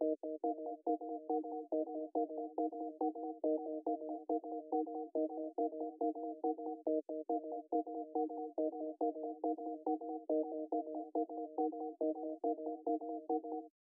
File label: melody